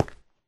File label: stone, step; Minecraft